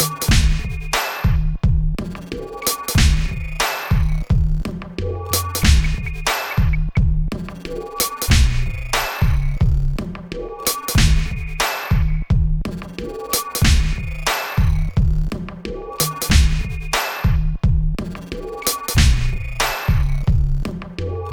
TC144 ohne AQVOX

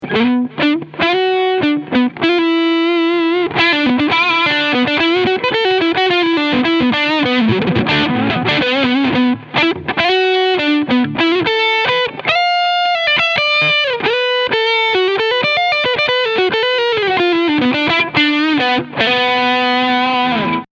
Sterling by Music Man Sabre beide PUs Crunch Sound

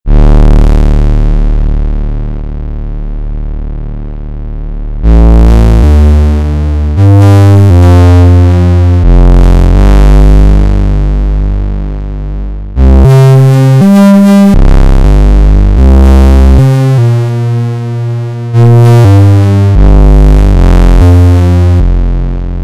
Flaechendings

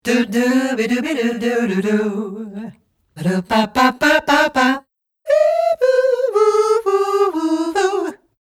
sägezahn

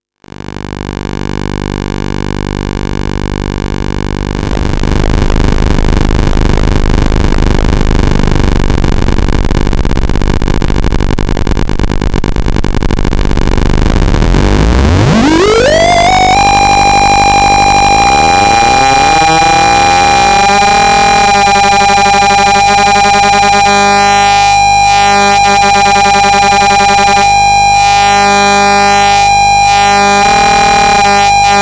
Ringmodulator

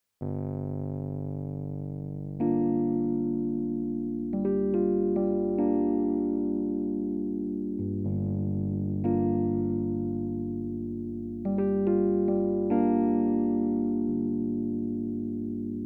Chorus Experimental LFO Rechteck zum Teil hohes Feedback

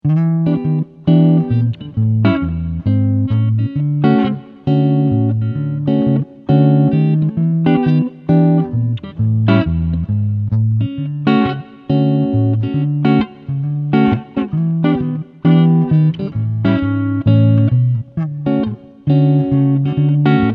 II V I Verbindung Frontpic

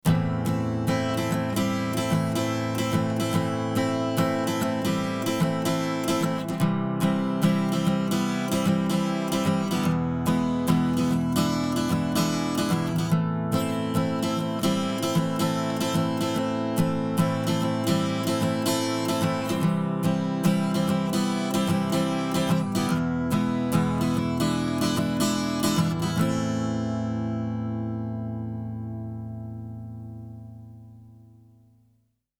Chandler Limited RS124 Western Gitarre

